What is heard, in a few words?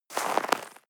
step snow walk single